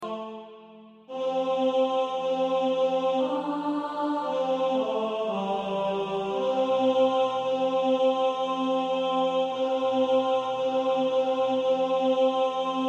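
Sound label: tenor, practice music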